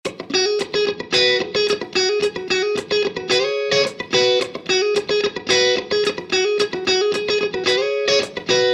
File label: Guitar